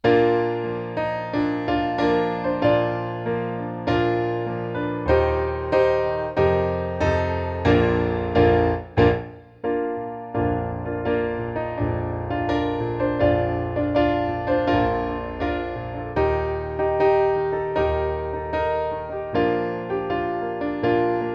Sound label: Battle Hymn 2 Verses Fast